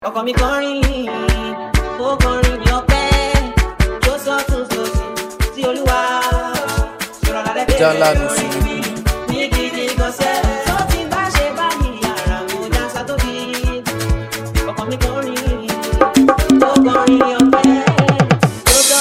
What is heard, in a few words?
Afro Dance